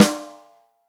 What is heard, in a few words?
ROCK SNARE CHROMATIC, Real Drum Kits